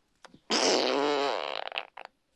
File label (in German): WET FURZ